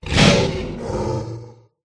mantikora attack voice up

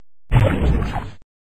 attack low

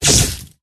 witch attack contact bottom

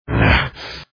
zombie attack

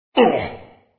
wound; norak

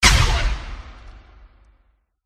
eldive boss attack contact middle

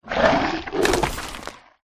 skolopendra attack voice bottom

